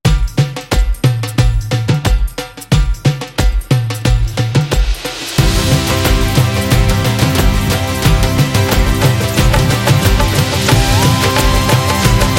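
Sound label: Sri Lanka, epic cinematic trailer music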